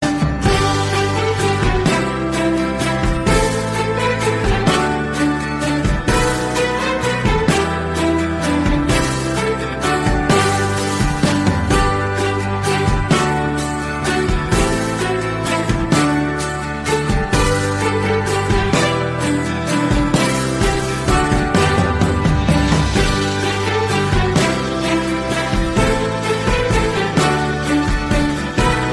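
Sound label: Christmas Ringtone; Jingle Bells; Xmas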